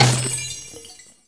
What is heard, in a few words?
Target Hit; Worms3D